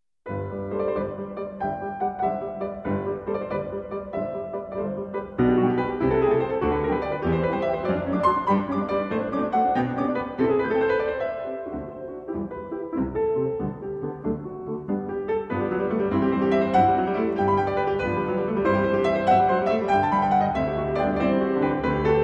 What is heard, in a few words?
Piano Sonata in G Major, 2 Pianos; No. 5; Arr. For 2 Pianos By Edvard Grieg